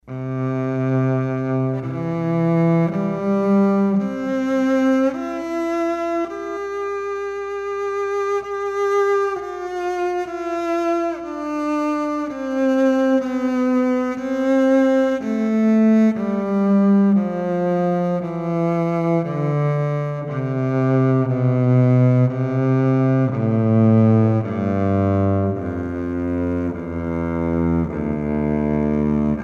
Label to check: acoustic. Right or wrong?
Right